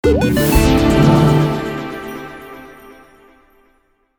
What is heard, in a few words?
Item Get Jingle; Nyakuza Metro